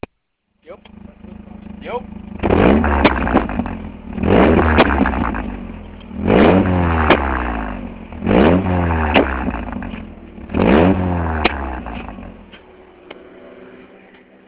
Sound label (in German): Auspuff; astra